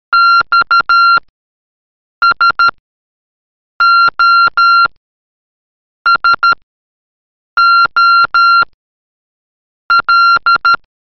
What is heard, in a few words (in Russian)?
ЬСОСОЛ МОРЗЕ; vasilkov virt mashina